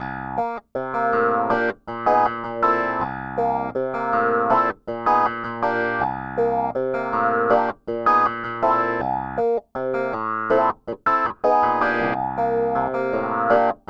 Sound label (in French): basse triades variations complet, variations chromatiques, funk piano; Triades b795 variations chromatiques 2; Second Line 2 + 3